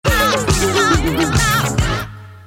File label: Knock Knock On Wood